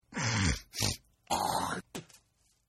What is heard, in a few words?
Snort n Spit; Snorting; Spitting; Human; Partners In Rhyme